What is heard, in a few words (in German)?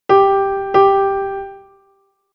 Intervalle sukzessiv Beispiel